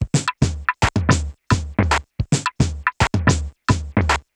Juno Filtered Korg MR-16 (110BPM), beats; musicradar; cyborg beats

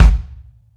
Bobo Kick, Kick One-Shots; KJ Sawka - Drum n Bass & Beyond; Drum Tool Box

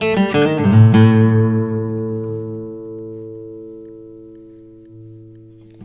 BARI LICK, Blues Guitar; ILIO; Fretworks; 120 BARI LICK

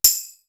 TAMBORINE; Univers Sons; Basicussions

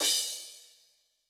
Crash B, Cymbal; Yamaha DTXpress-II

